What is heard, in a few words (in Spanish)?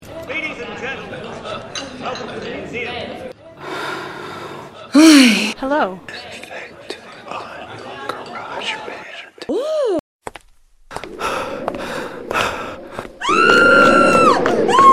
musicalizada